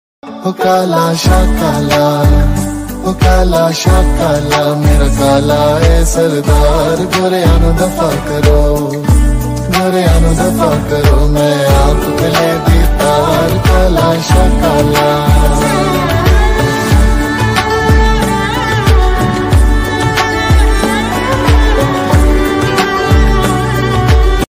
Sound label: panjabi ringtone